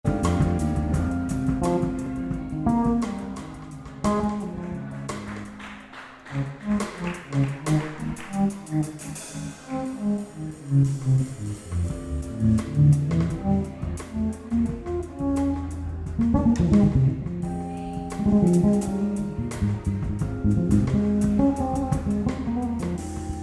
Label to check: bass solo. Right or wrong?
Right